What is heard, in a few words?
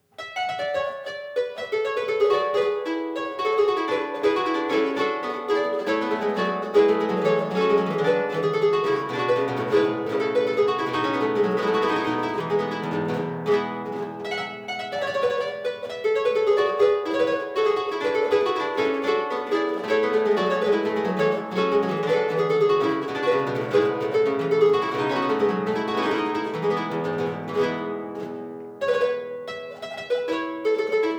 Suite B flat Gyque, harpsichord; Johann Pachelbel - Gyque (2x8') - Performed by Sebastian Bottone; Sorli Harpsichords and Lautenwercke